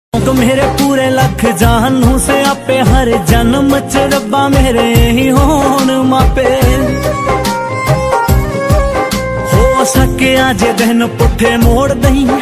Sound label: panjabi ringtone